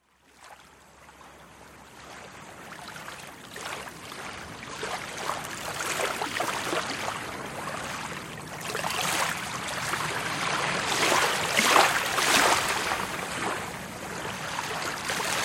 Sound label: brownsea island waves lapping along the shore